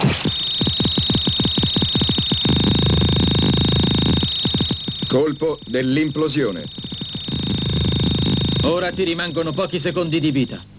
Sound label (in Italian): implosione